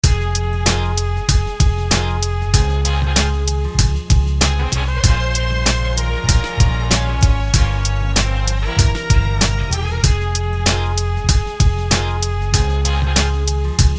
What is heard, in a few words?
beat 96 bpm; thingbeat